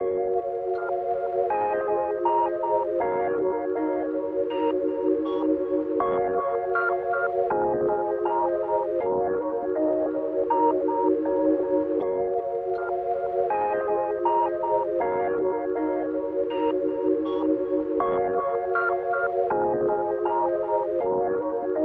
80 BPM A# Min Pad, Lofi; Melodies; Loop Stems; Cymatics; GHOST (Beta Pack); Away Again

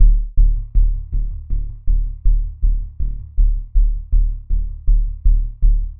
Bass Loop 80 Low Pulse D; Ghosthack

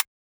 Closed Hat; Ghosthack Advent Calendar 2017 Day 5

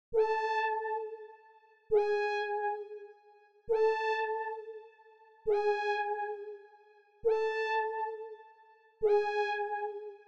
135 BPM F# Min Synth Lead, melody loop stem; Cymatics; 2022 Melody Collection; Eco

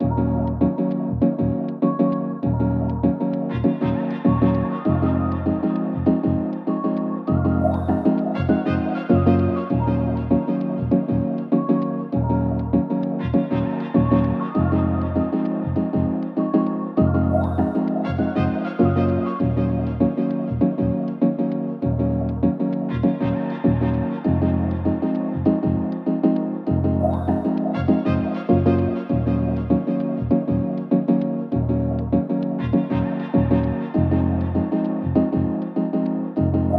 99 BPM A# Min, Melody; Feeling Good; Cymatics; Infinity Melody Collection

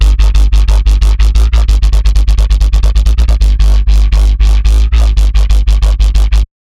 Bassline C3 140, Dubstep; GhostHack

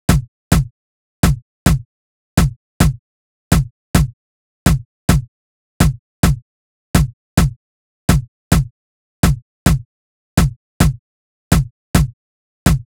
Drum Loop, 105 BPM Tom, Latin; Loop Stems; Crisp

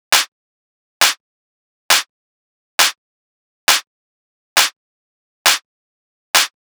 Clap Loop 135, Techno

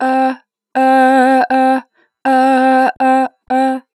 Ah - 2 bar vib, Natural Vibrato, Vocals; Harmor Vocals

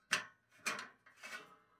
Grabbing Metal Tray; Fairlight Sound Lib

